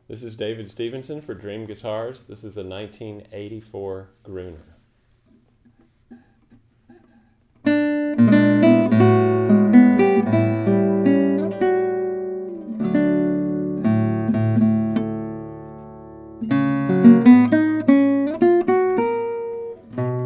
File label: classical, nylon-string classical guitar; classical guitars; gruner